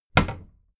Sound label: cabinet door close heavy